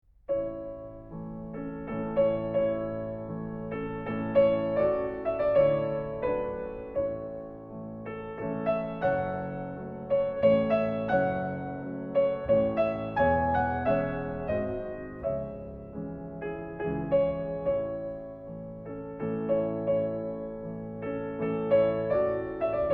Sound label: Waltz For Piano Duet; Brahms; 15. in A; 16 Waltzes Op.39